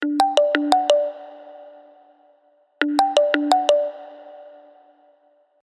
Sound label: illuminate, zvuk telefona alcatel